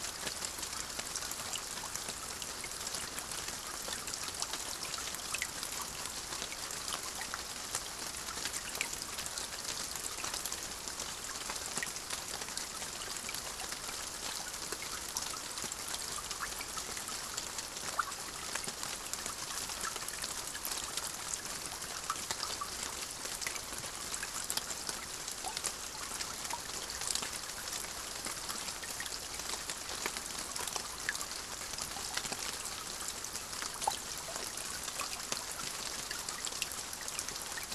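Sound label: Rain moderate light drippy aftermath; boom library; Thunder And Rain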